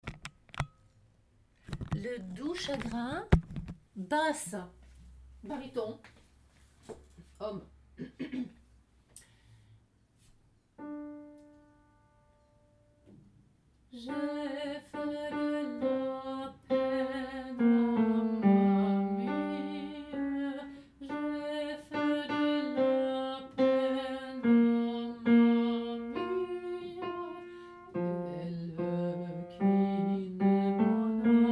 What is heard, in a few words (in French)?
le doux chagrin bar bas; Basse